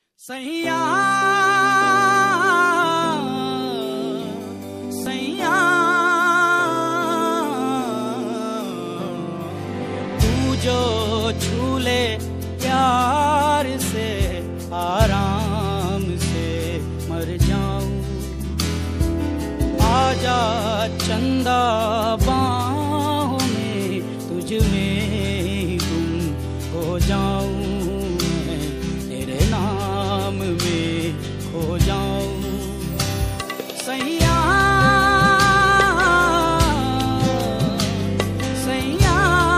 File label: sad ringtone emotional ringtone hindi sad tone